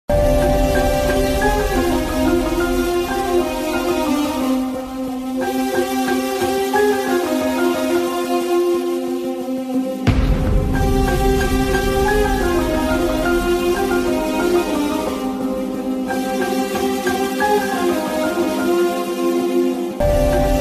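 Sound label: movie best background music; vaishali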